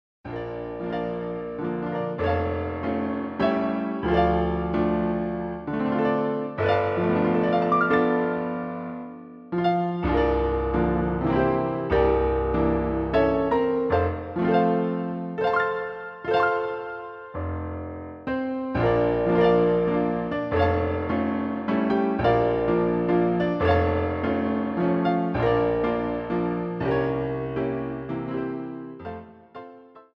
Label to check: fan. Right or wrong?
Wrong